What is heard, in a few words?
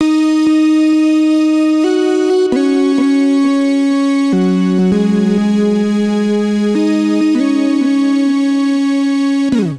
Lead Bb minor 81 bpm; MIDI Clips; Melodic; A Kinder Bud; Ableton; Factory Packs; Chop and Swing